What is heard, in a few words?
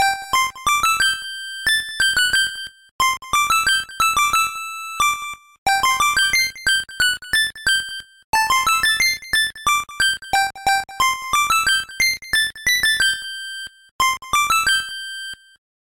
laundry jingle; TS Underswap